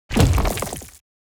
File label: building upgrade build